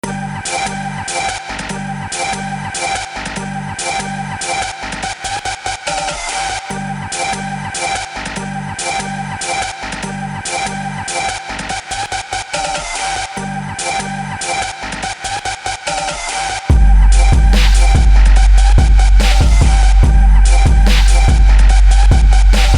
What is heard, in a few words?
Trap Remix